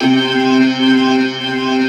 C3, Keyboards Old School; USB Soundscan vol.09; AKAI

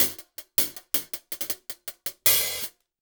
080, Top Ends, Beats; Zero-G; Monster Beats

